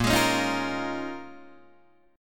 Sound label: Bb Minor 11th Bb down Guitar DADGAD